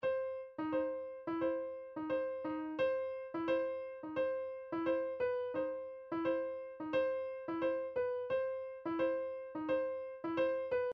percussion midi ride cymbals 087 random harmonic ride; Stylus